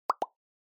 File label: Smartphone UI Sound Dry